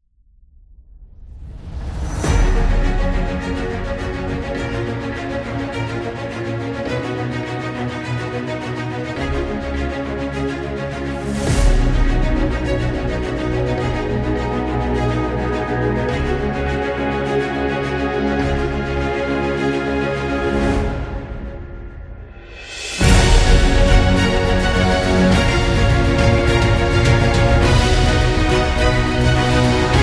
Epic Awards, epic music; epic music vol 2

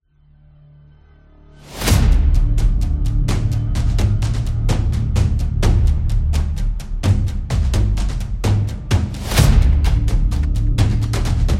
Horror intro

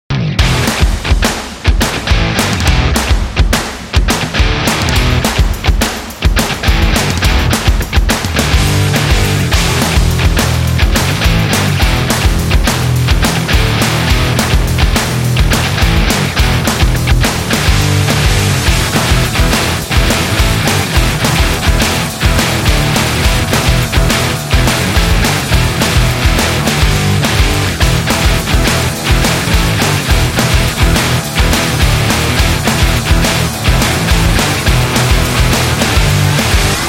The Upbeat Indie Rock

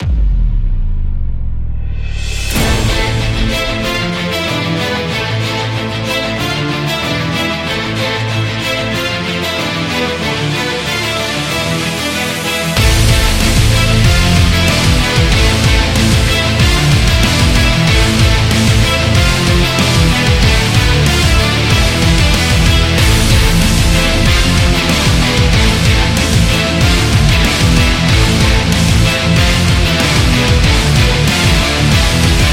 Sport Metal

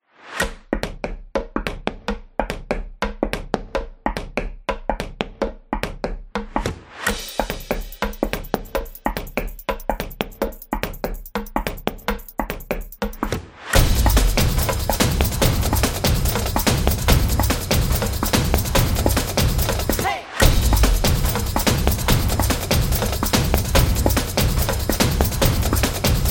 Drums Background Music; percussive nation vol 5

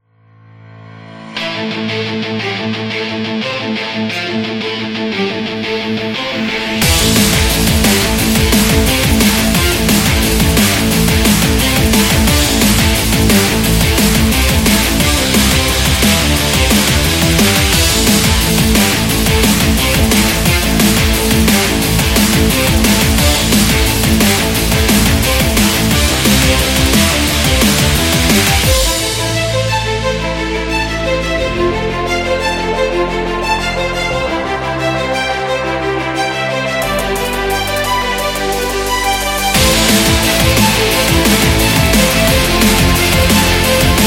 Race

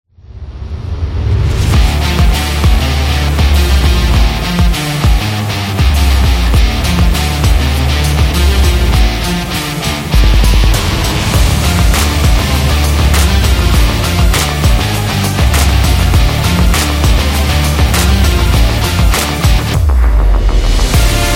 Stadium Indie

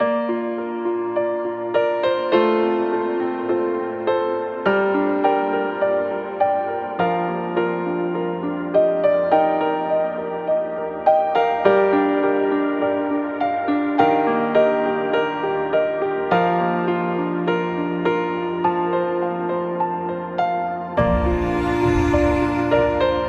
The Happy Wedding, happy music; happy music vol 8

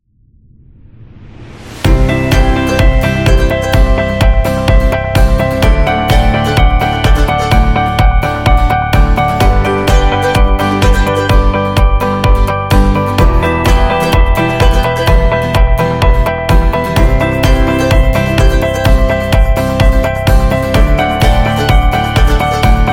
Fresh Corporate; corporate music vol 2

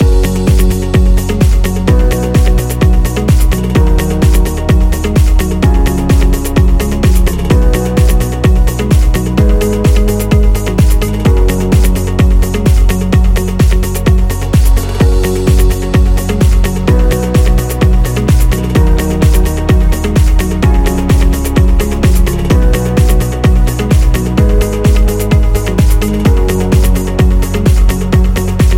Corporation Loop

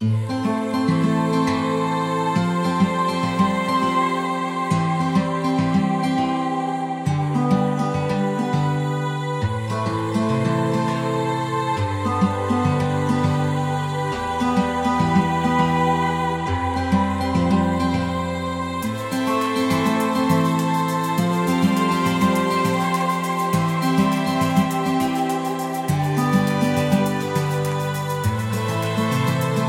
The Guitar

